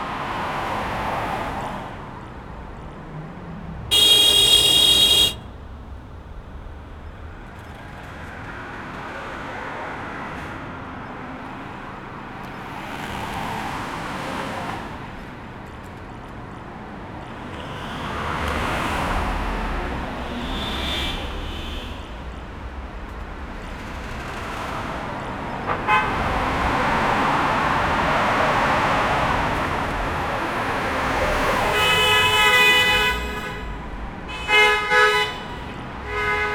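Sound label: sound of typical car traffic, city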